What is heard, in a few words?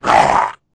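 Npc Cyclops Damage; FlyForInsanity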